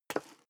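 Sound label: step high heels wood parquet slide short, footsteps; schritte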